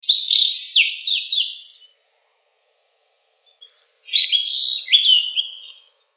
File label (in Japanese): アオジ